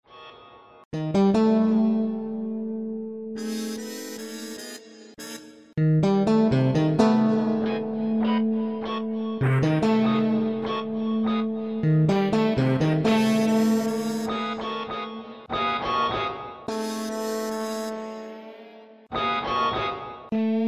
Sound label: improv plus chords